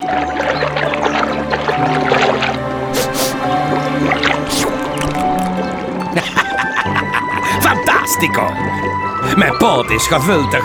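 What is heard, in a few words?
Outro Victory Dutch; arthur and the revenge of maltazard; wii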